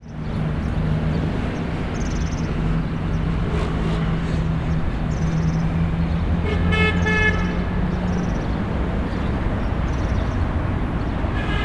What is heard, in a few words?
morning traffic